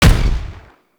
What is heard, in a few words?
Crew Ship Turret Fire 3D; Warframe; RailJack